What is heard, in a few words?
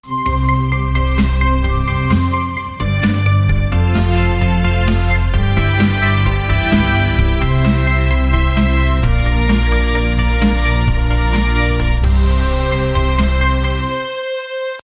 create a victory tune mak, royalty